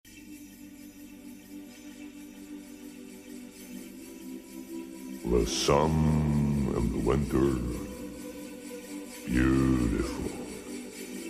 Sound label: jingle bells